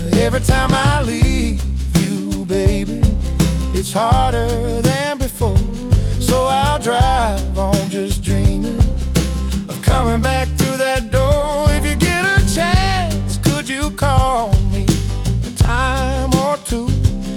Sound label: Ringtone